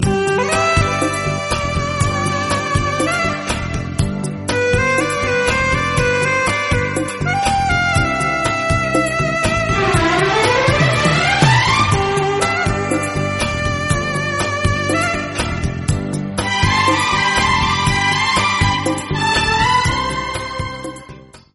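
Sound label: saxophone tune ringtone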